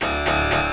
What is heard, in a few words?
electric guitar; string instruments